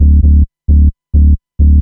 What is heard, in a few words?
bass, dance